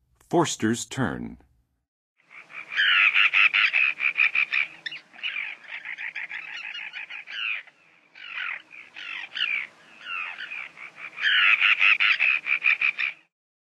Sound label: Forsters Tern; North America; Western USA; Western USA Songbirds